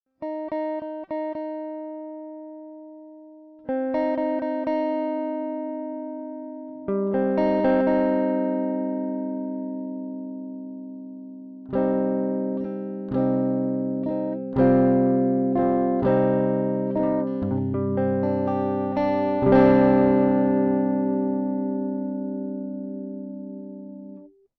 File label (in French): guitare sans effet; pulsar echorec